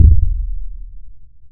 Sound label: impact, bubbles